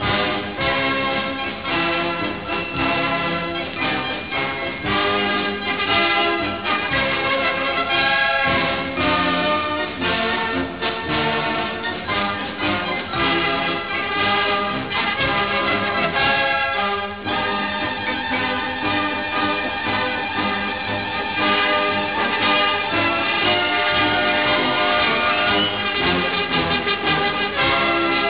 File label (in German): panzerlied music